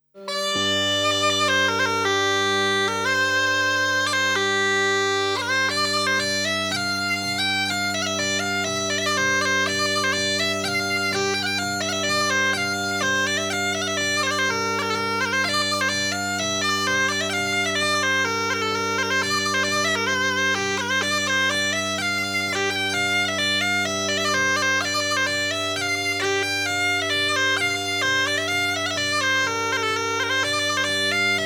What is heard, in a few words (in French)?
Polka; Polka de Chastagnol